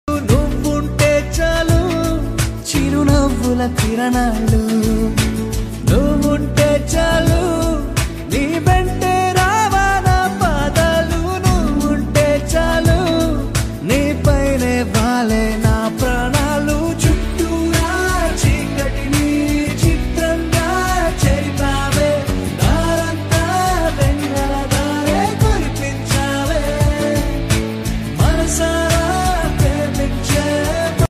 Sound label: love ringtone romantic love song ringtone